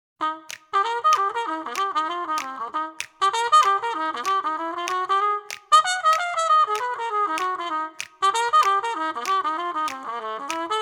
Blues

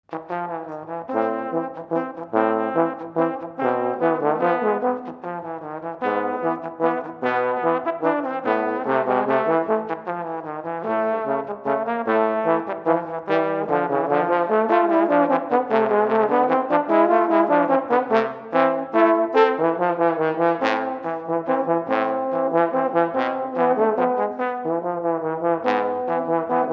Two Trombones

